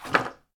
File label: Plank Stn Hit